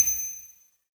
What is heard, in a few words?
Collect star